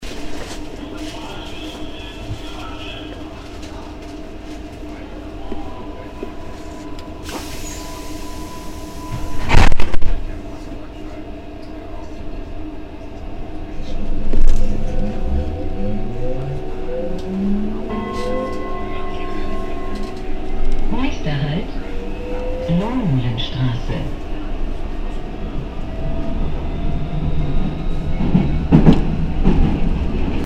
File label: subway departure inside